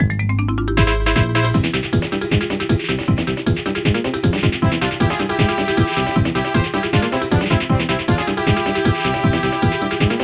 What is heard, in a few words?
Battle Theme; M&LPiT